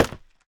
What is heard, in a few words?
scaffold, step; minecraft